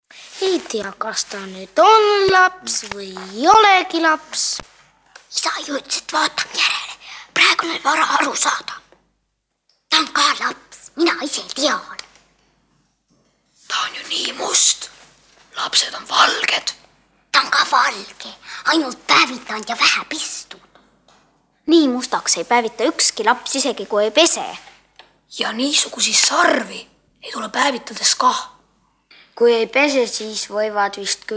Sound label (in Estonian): instrumentaal